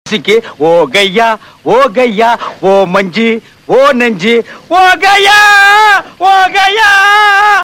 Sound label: Scremming